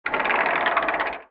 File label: sliding door